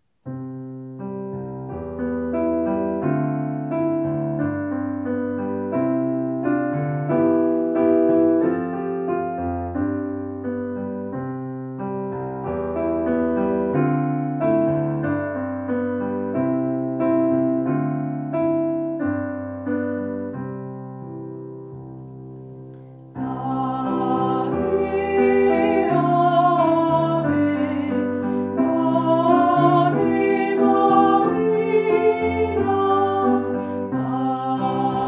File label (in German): Ave Maria Ruf